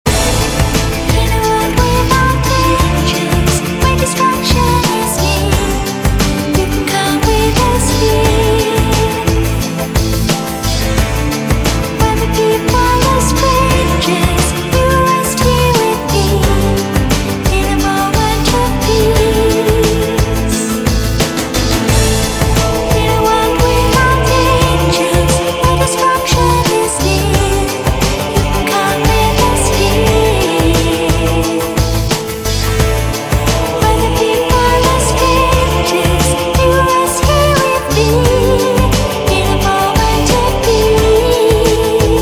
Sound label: Gregorian